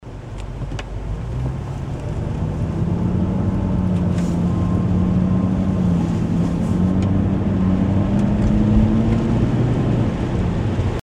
louder rumbling noise during low speed acceleration, gs 2nd gen; 75 gal tank; gs 2nd gen 1998-2005